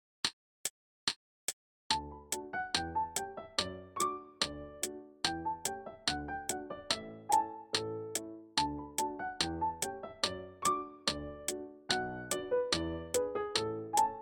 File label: gavotte repeats full tempo with clicks